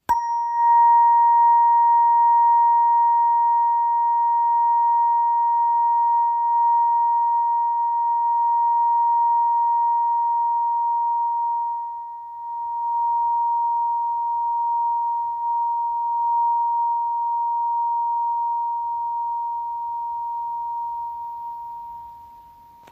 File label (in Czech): 936 Hz, terapeuticka ladicka solfeggio hlinik; epifyza